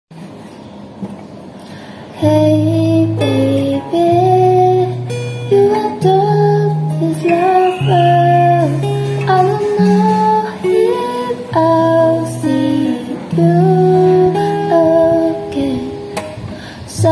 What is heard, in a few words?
Guitar